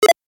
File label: lock